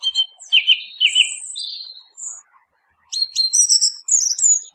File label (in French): grive musicienne